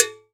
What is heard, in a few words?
JANGKOQUI, Latin Hand Perc; E-MU Producer Series Vol. 2; More Studio Essentials; Composer